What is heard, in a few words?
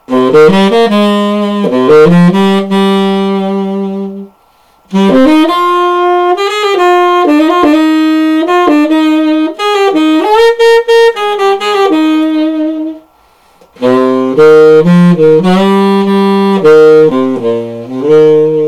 jazz